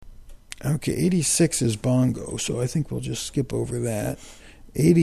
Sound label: bongo